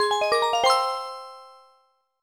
Jingle Happy; Super Mario 64 DS; NDS

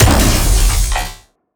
Revolver Charged

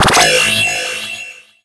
Fire Hypnobeam

